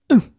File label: Getting Hit